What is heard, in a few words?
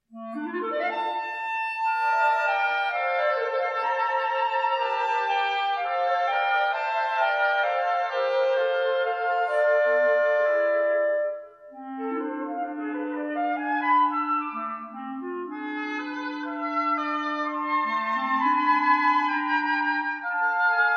Clarinet